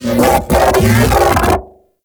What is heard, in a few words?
Mech Ambient Vocal